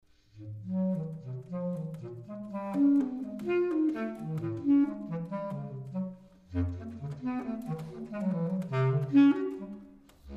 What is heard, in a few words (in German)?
bassklarinatte